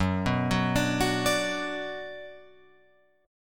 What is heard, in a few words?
Gb Suspended 4th Sharp 5th, Guitar, Open F; arpeggiated; JGuitar